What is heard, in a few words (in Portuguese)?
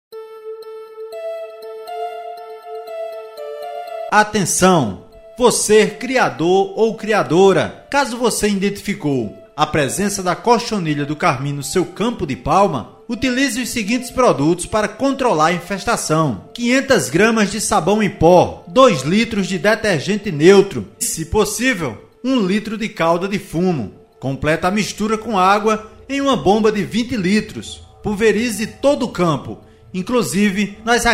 Vinheta com musica; cochonilha do carmim